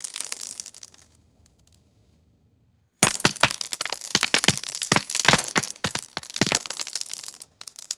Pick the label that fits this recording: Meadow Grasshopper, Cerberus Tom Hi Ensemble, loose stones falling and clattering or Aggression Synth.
loose stones falling and clattering